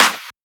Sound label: Clap; FLPRO